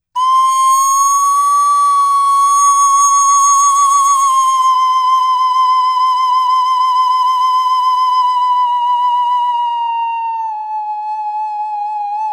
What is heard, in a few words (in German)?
Kuhhorn beisp